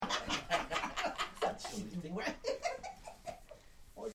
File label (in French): rire